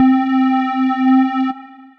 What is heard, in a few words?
Trap Glide, Synth Lead; Ableton; Factory Packs; Beat Tools